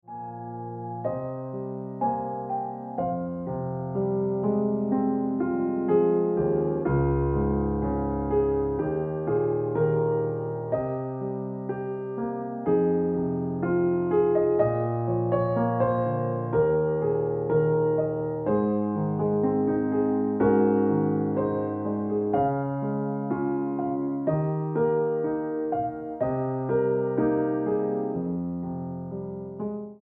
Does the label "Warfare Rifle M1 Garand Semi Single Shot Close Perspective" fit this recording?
No